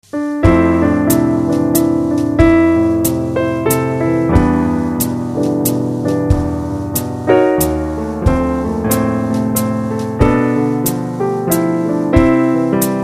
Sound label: piano valtz